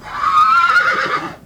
Pferd

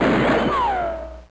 Pistole

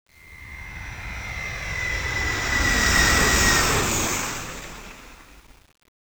Flugzeug vorbei

